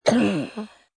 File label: Cough Kid Chest, Cough, child, single, bronchitis, sick, cold (human voice)